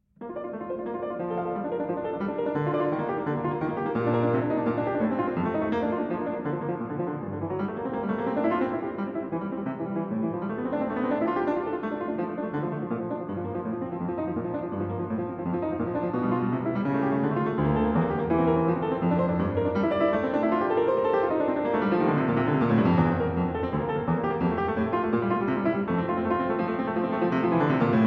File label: Prelude in B-Flat Major; Prelude & Fugue in B-Flat Major, BWV 866